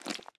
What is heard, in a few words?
slime run, step; blocks; Minecraft; Remastered